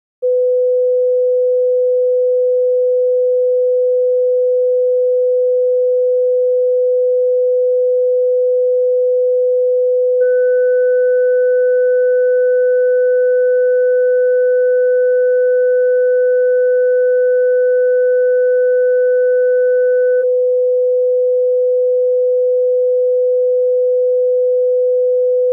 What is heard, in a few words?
Third harmonic distortion A/B Test; (L+R); Stereophile Test CD, Vol 2